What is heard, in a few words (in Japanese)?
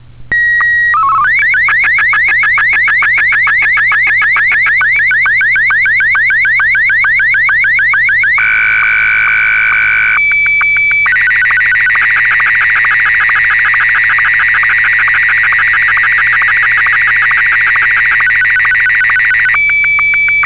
SSTV, M1; ﾏ-ﾁﾝM1 約１１４秒